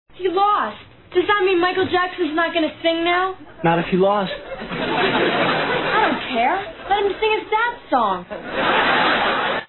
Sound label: sad song